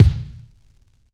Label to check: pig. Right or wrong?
Wrong